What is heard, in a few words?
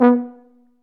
F TUBA, Solo Brass, Tuba stacc